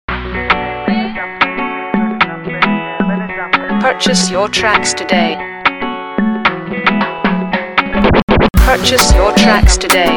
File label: Freebeat; Ogbenistickz